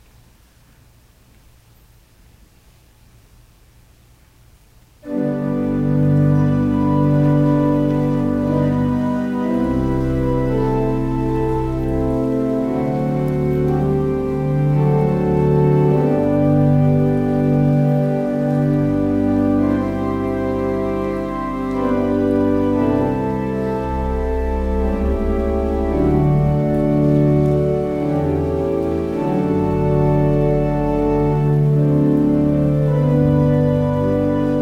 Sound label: Organ Music; Netherlands Reformed Congregation, St. Catharines, ON